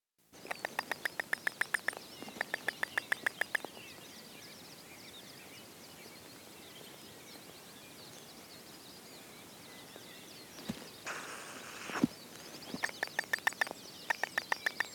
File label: Short-eared Owl, Asio flammeus; owls